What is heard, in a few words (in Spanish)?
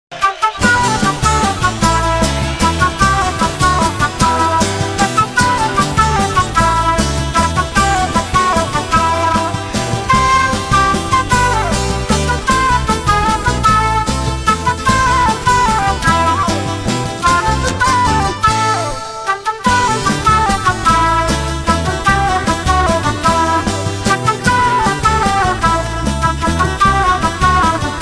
Flauta